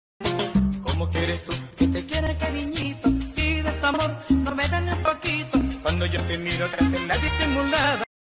mexican music

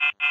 fuse fail

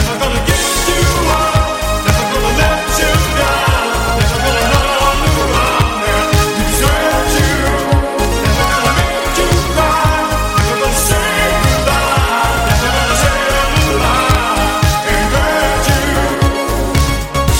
synthwave

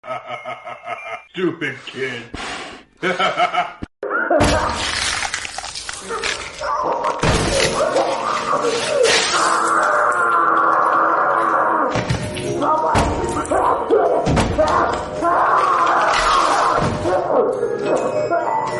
spring lock failure

